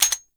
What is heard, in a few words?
Gun Sweetener C, Metallic; Warframe; Gun Sweeteners; Weapons